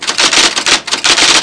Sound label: typing fast; Sound Library 2000; Wayzata Technology; Household